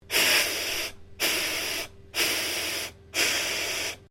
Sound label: Ships horn broken; Boats; Vehicles; Sounds onboard HMAS Castlemaine